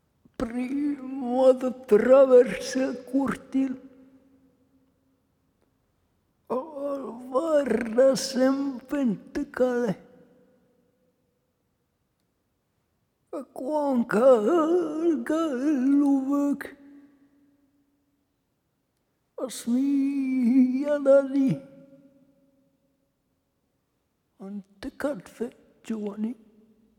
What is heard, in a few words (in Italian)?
voce